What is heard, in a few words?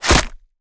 gore head hack dry; soundmorph